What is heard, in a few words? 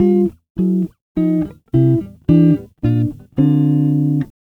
Acoustic Guitar; Guitars